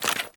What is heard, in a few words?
Generic Footstep; Warframe; Dagath